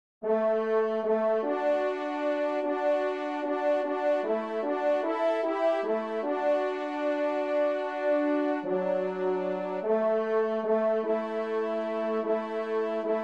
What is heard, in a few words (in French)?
Kyrie TRP; Devert; Kyrie 1e Messe